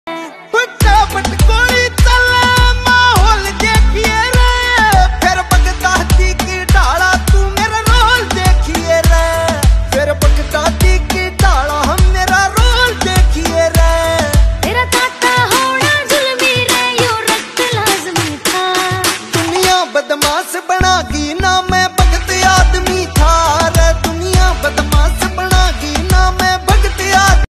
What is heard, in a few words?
haryanvi ringtone